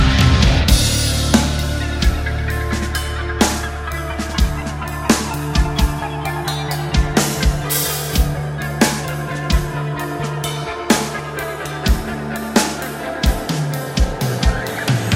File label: Guitar Riffs